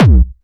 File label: hard bass drumz